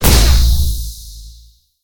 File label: Activate; smite; raijin